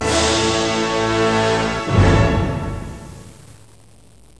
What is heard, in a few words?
TROMBONA, ORCHHITS